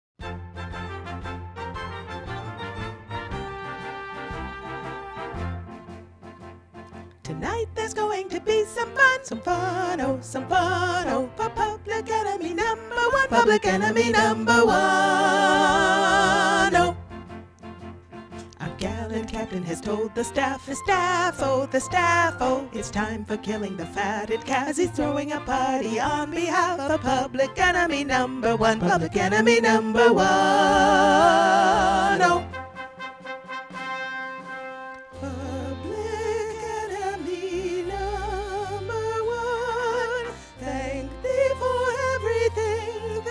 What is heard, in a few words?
ensemble